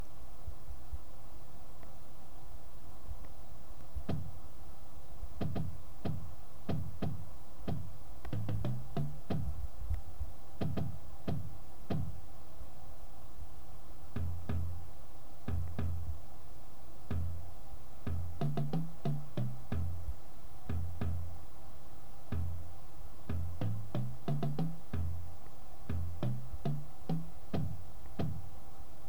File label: bass, drumline